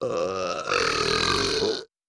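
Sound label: Double Toned Gross, belching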